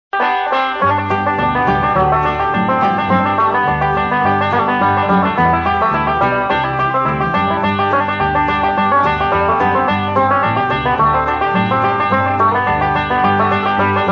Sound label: Fast, banjo